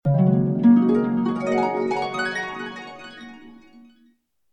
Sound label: game completed